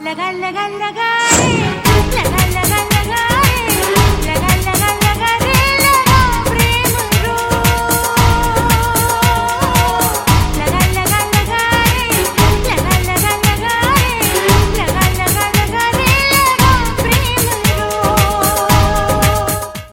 female voice, hindi, ringtone; mobile; maine pyaar kyun kiya 2005

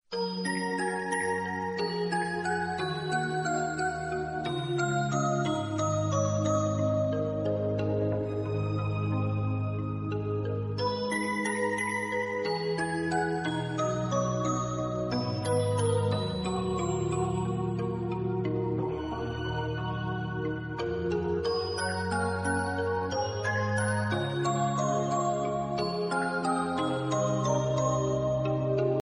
polish lovely sound

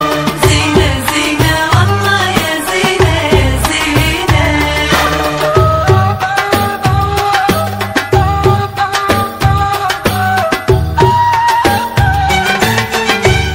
magical egyptian ringtone

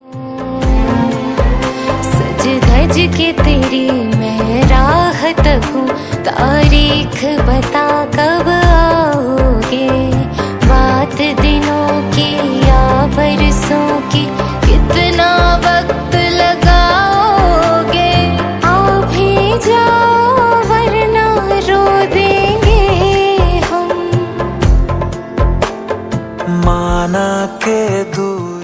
bollywood song, ringtone

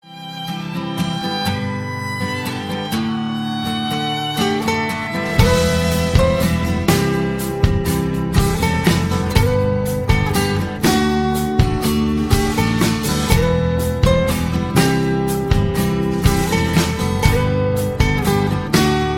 new best romantic ringtone

